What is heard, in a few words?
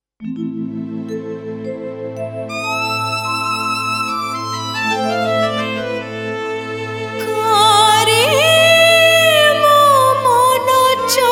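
female version, Odia Song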